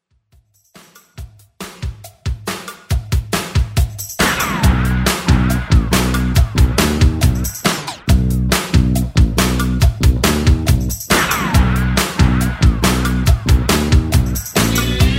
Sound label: 80s Pop Rock Quick